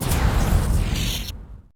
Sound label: Start Snd; Fruity Loops 4.1; FLStudio